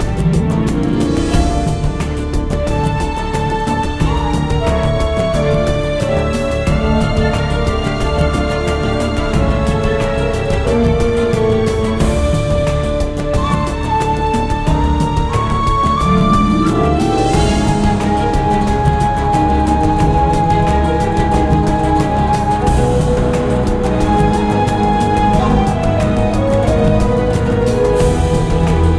MUSIC FIGHT ACTIVE; ARABIA; GENIE KING

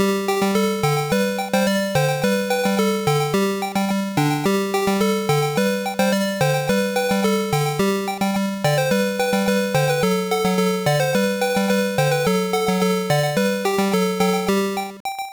Taiko Performance Song

